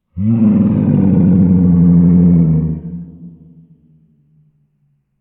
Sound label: Wounded lion growling; su tu